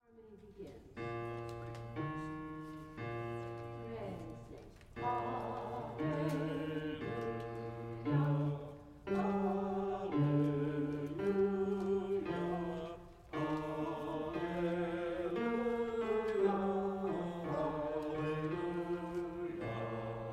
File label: bass; Good News Acclamation